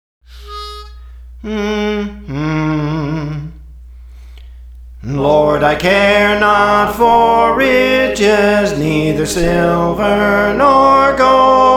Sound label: Soprano, Songs; Praise For The Lord Training; Lord I Care Not for Riches